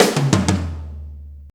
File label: 90 BREAK, DRUMS